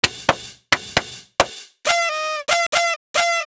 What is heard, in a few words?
handclap